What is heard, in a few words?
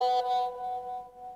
whatsapp tone; toque de celular